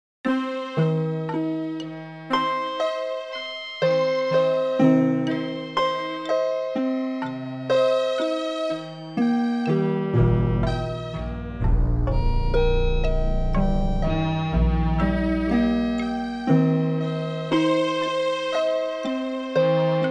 Seven equal romp, fractal music Chord Progressions